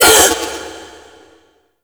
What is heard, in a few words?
Minigame Ring Fail; Birth Minigame; Warframe; Jade Shadows